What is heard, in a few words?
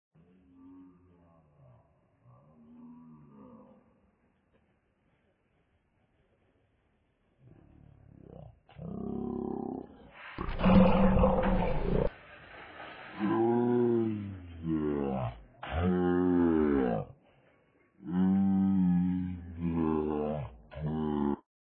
turkey